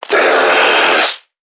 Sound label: NPC Creatures Vocalisations Infected; Five Nights At Akers; soma; npc construct